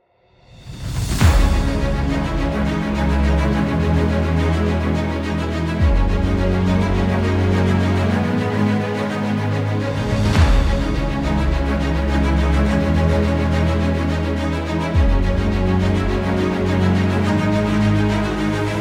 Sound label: epic background music